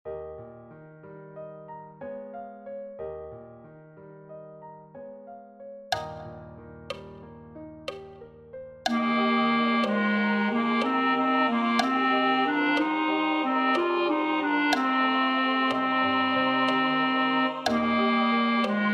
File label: Wedding chorus, T; In Windsor Forest